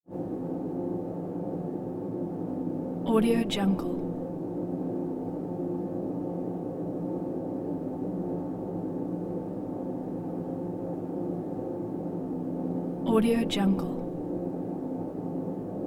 ventilator deep rumble airy tone technical

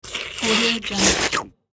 eat swallow

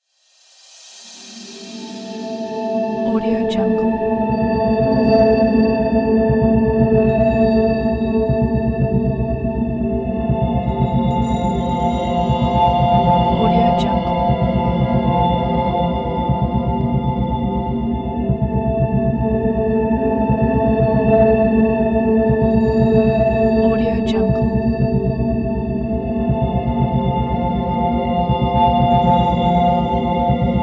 enigmatical texture

